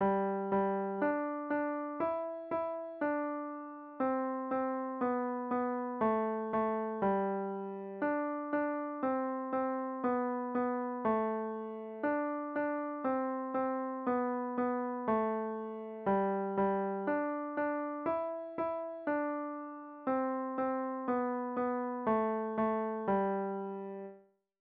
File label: twinkle twinkle little star beginner piano